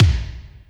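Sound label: kick Stab HL, Alesis D4 drum machine; 300 Drum Machines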